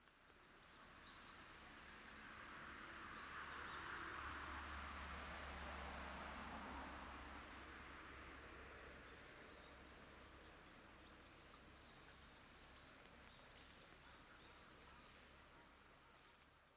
森で車が通り過ぎる音

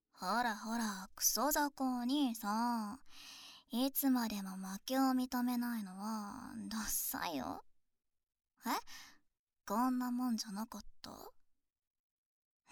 右耳舐めパート

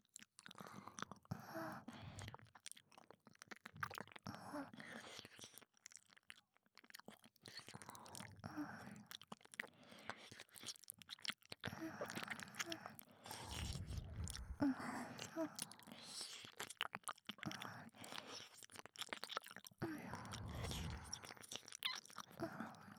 左 右 耳舐め, ループ用耳舐め; 左まひる×右よるか

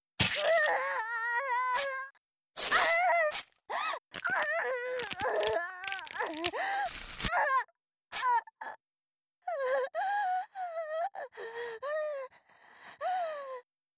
肩を刺す

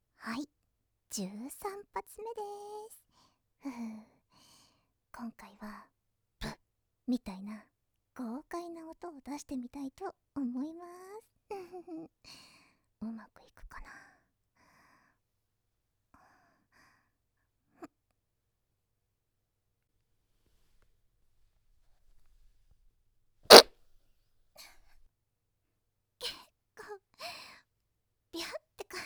放屁音; 使用放屁音収録実演音声集; 13発目 初公開

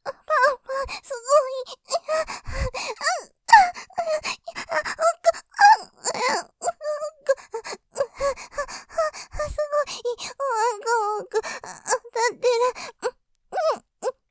喘ぎ; ぱんぱんすごい奥！おまんこの奥当たってる; ループで楽しむ！シェフの気まぐれ抜き抜きコース; モノラル

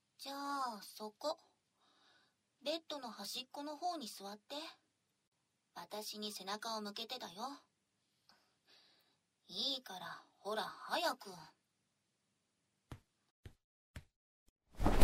耳舐め＆手コキ

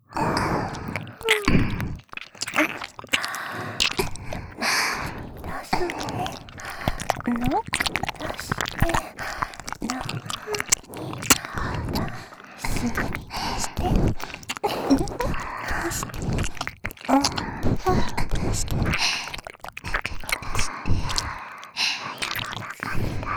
耳舐め; 全員

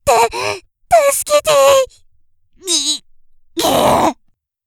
首絞め風

